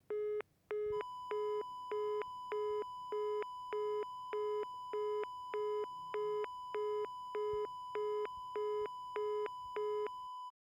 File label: phone line disconnect beeps